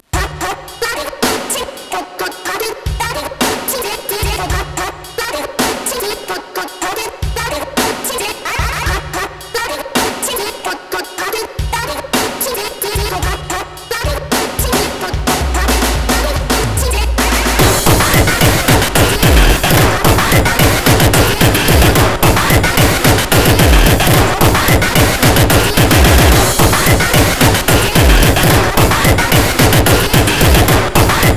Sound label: Noise Smoking